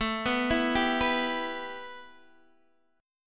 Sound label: A 7th Suspended 2nd A, Guitar Open D; chord